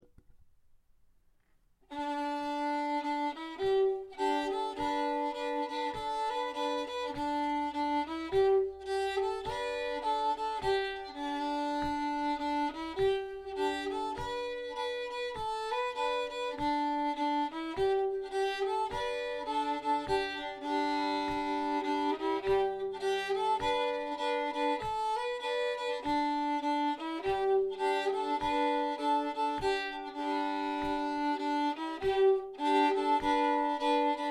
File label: Melody